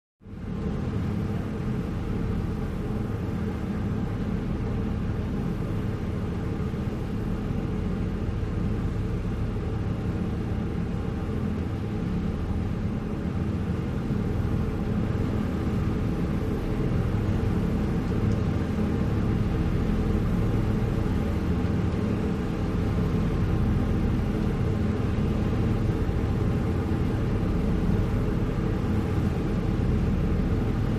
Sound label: Ferry Boat Cruise